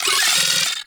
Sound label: Arachnoid Micro Vocal Attack; Warframe